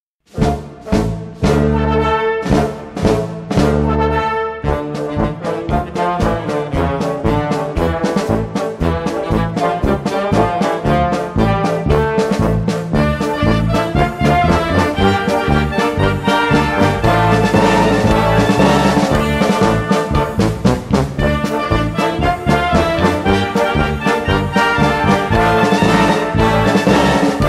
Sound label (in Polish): Maszeruja dzieci karaoke